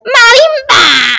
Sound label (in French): marimba bois